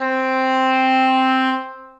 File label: English Horn Solo Legato, Orchestral Woodwinds; Ableton